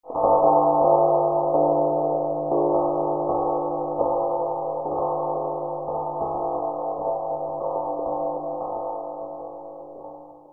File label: BELLS